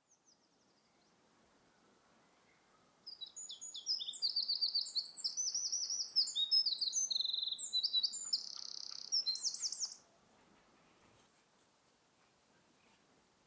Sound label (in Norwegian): gjerdesmett